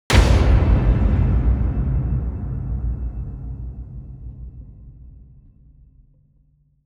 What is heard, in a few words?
cinematic impact echo; Hits, Impacts; mixkit